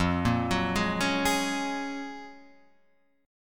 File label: F sharp Major 9th